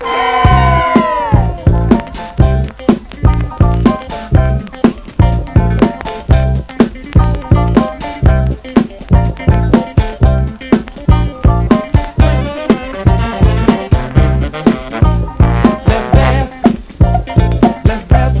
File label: Old Funk Music